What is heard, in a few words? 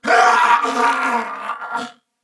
Death, Male; Dialog; Barks; Battle; Corpus Venus; Warframe; CorpusVenusMaleA